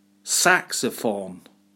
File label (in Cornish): Saksofon